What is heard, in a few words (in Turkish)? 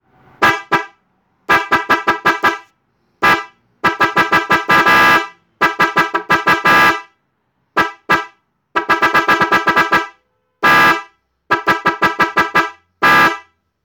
Cat Kat Korna sesi